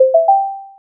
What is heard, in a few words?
arpeggio